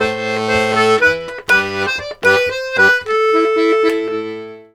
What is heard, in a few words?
Accordions, 120 laride